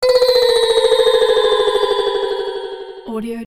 kids game lose flutter decend negative plucking bling